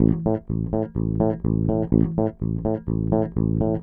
DEEPHOUSE BASS 125 A

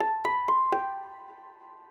ethno, 125, A

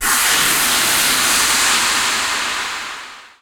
TECHNO FX 140

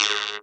Vocoded Drums; RadioactiveSandwich - Homunculus

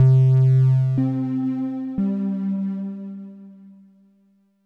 ECHO C2, SPACE ECHO

